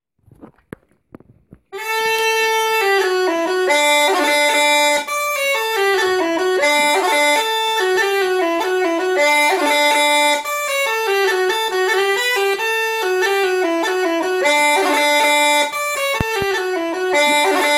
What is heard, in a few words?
chanter